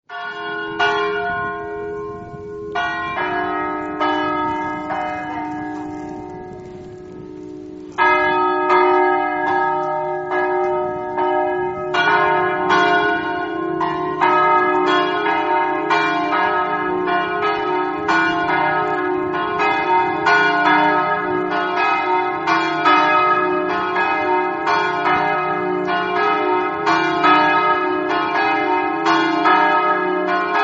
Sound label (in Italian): campane